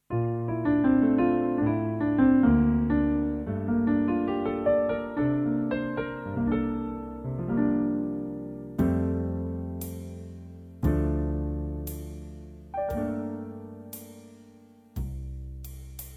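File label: backing track; key - F - vocal range - C to E; Superlative ballad; Original Livetrak arrangement.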